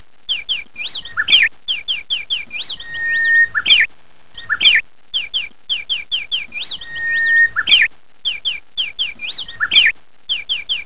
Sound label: tweet